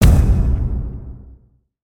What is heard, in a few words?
Troll Drum Long